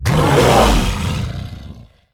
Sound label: Ironhide Direhorn Attack